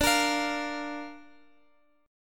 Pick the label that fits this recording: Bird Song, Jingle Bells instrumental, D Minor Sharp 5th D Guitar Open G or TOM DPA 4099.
D Minor Sharp 5th D Guitar Open G